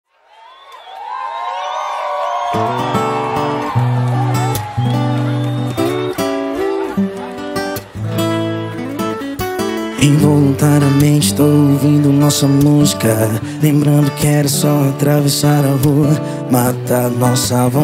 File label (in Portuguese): funk e sertanejo